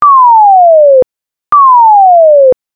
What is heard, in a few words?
Tone